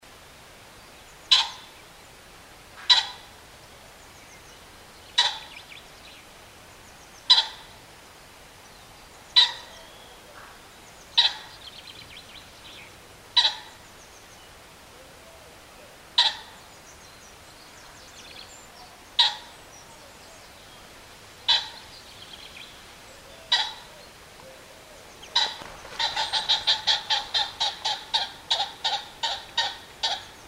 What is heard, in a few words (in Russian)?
zvuki prirody i fazana